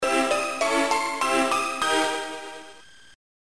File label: Worried; Cabbage Patch Kids: The Puppy Patch Rescue; GBA